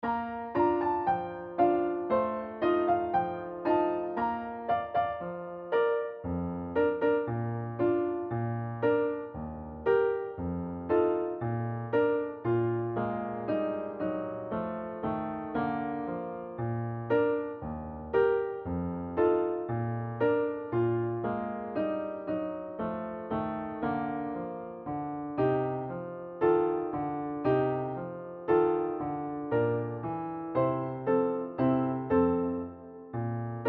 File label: performance, band